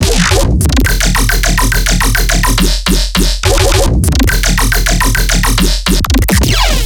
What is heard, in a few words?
Riddim Bass Loop 140 F; Dubstep; Riddim Basslines; Stumble; Ghosthack; Advent Calendar 2019 Day 17; Riddim Bass Loops